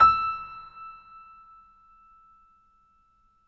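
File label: Acoustic Piano, Right; InVision Interactive; Miroslav Vitous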